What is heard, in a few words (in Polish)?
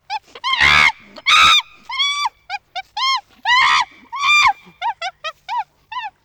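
małpa, głos; szesciolatki; karty aktywnosci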